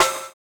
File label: Snare, jungle breaks drum sounds